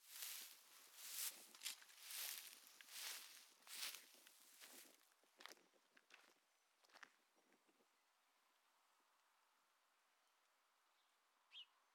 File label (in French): Friche Scierie Châtenois Marche Herbe Et Pépillement Rapide